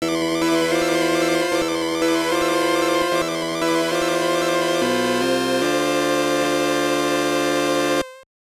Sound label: Mission Failed; Operation Wolf; TurboGrafx-16